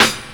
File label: Snare One Shot, Drums